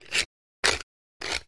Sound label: Pepper Grinder Vari